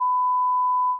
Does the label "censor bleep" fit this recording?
Yes